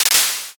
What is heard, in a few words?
clap